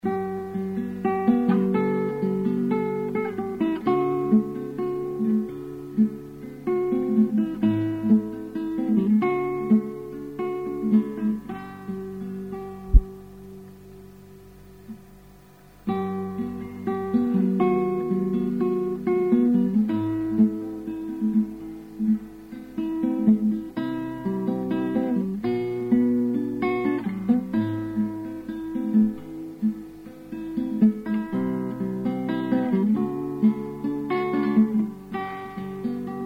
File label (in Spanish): Guitarra solo